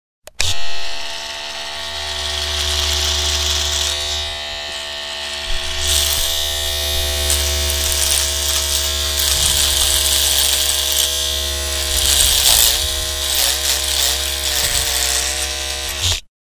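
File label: sound of razor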